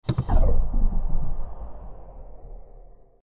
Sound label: hydraulic blast